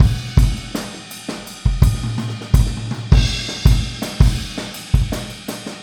Boogaloo Fill Kick, 82 BPM; Sonic Foundry; Drums from the Big Room The Mixes

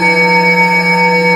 F3 TUBE BE; Northstar; The Wizard; FLUROBELLS

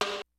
HITS BITS; Kid Nepro; Elite

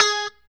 Pop G#4, Strat Pop

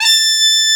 Mizmar A5; Multisamples; Digital Sound Factory Planet Earth

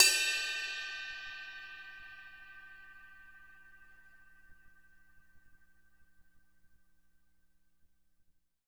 CRASH BELL

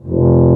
Tuba mf F0; Digital Sound Factory Virtuoso

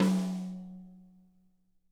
tom, drum

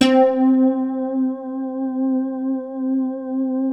NYLO HARD C3; Music Store - Claudius Diemeter Schwerlos

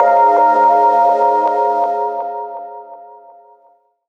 RAW LINE FX, Gbm; Rawcutz - Nu Forms

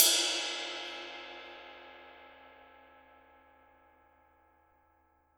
JAZZ KIT RIDE, Drums Acoustic; AKAI; 90s sample CD; USB Soundscan vol.10; JAZZKIT 3

